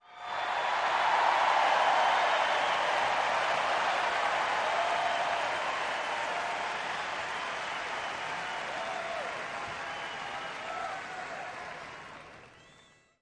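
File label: Crowd 50000 Whistle, large int; Crowd ( 50, 000 ): with Whistles / Applause, Surge / Horn In Background. Cheers Crowd, Huge.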